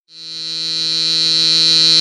Speaker Effect Sms

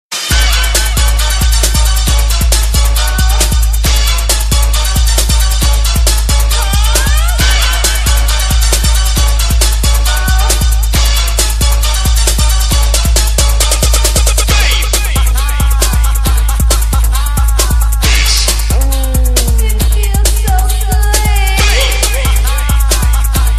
Hot Bass

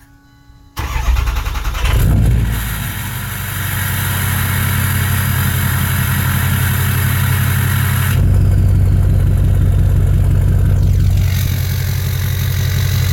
Diesel

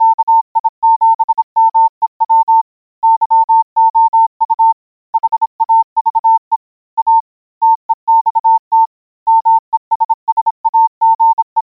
Morse code

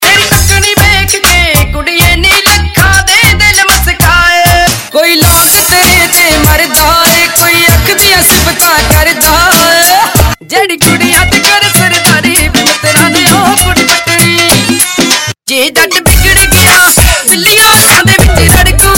Non Stop Dj

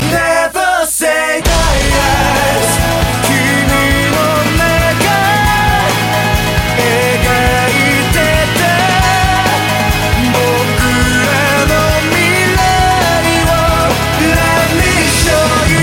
Criminal Scream

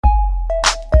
Small Sms

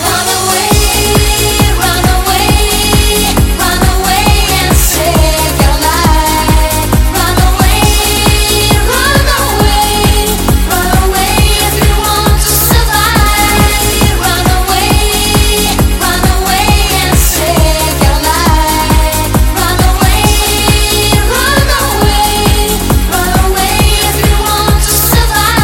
90s DANCE MIX

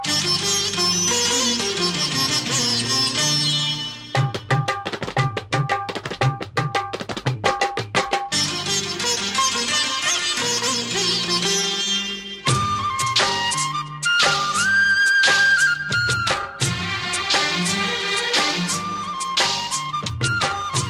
Classic Melody

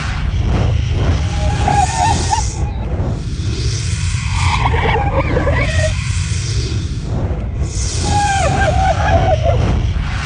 Fire Spirits

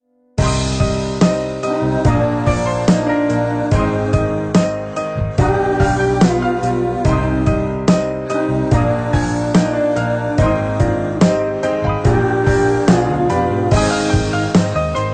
Ultimate sad; battle; meloboom

